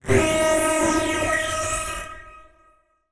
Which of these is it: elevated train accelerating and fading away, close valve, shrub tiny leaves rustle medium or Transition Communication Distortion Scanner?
Transition Communication Distortion Scanner